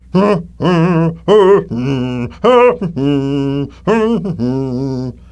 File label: prize idle balloon foot; Ringling Bros. and Barnum & Bailey; Wii